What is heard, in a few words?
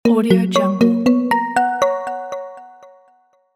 smartphone ringtone